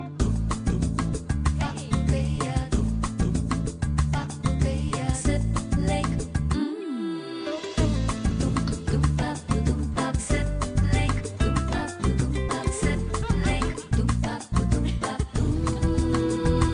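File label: Cafe Jingle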